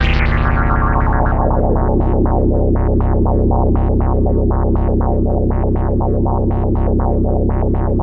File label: analog, special fx twisted; USB Soundscan; AKAI